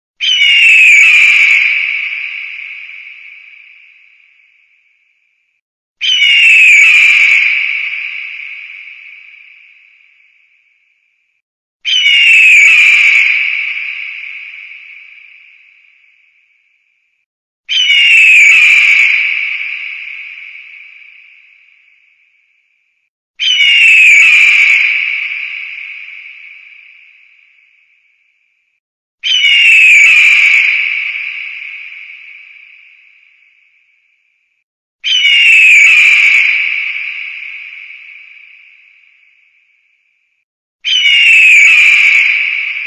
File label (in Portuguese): Canto da Águia do Mar